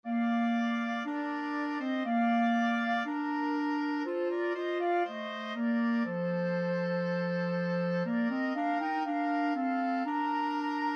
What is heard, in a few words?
flute oboe clarinet